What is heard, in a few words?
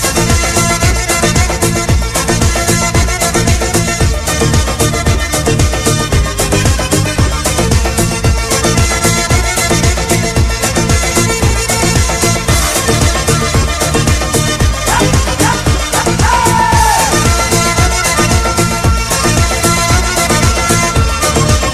accordion; alternative